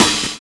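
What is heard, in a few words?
MUTE CRASH; Univers Sons - Kitch 70s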